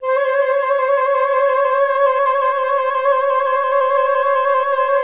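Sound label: flute C4; Best Service; Peter Siedlaczek's Advanced Orchestra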